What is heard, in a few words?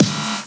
Snares & Claps; Vengeance Samples